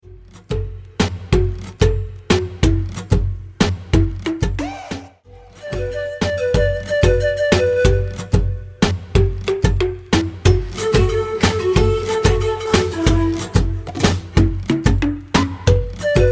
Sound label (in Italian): Kizomba Canto